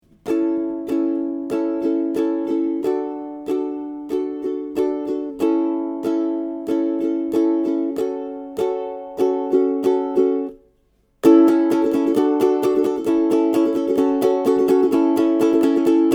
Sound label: Strum, ukulele